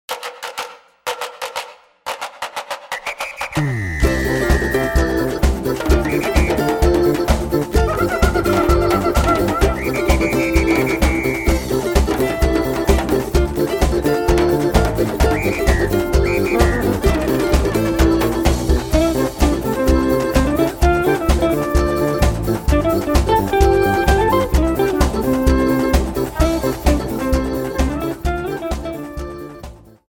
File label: bounce